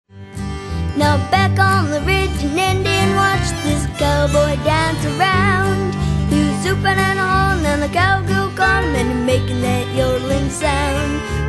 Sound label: Cowboy Yodel